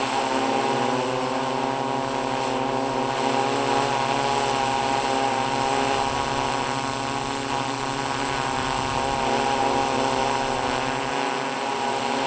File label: drone loop, vehicles; Counter Strike Model Pack; CS:GO